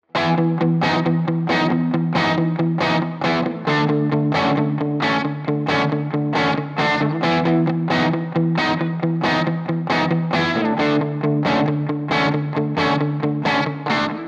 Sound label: D'Addario XS Electric